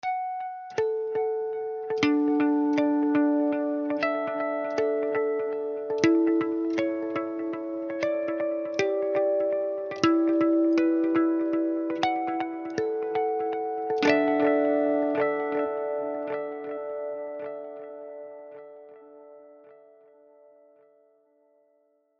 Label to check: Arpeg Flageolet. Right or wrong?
Right